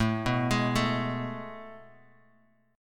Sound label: A Major 7th Suspended 2nd, Bass Standard; AM7sus2 arpeggiated; JGuitar